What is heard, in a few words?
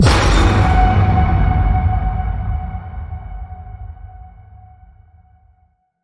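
hit horror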